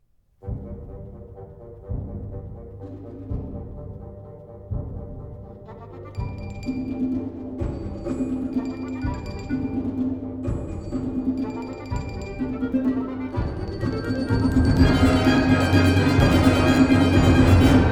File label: Bass; VIII. The People Turned from Their Righteousness